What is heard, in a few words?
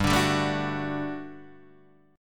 G Major 7th Suspended 2nd G, Guitar Standard; strummed